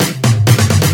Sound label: Fill Ins 128BPM; Vengeance Samples